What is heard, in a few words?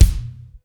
KICK, Hip Hop